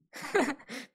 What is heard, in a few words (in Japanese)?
笑い; ラブライブライブ